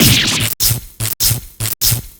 taze; blackquill

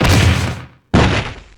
fall thud

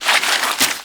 many fish; orla

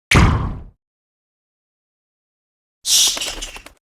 bat; betty

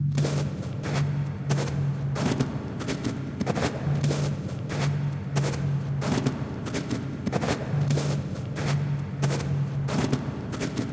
snow walk

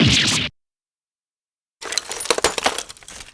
damage; scuttlebutt

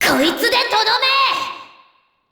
victory; makoto

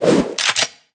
gun

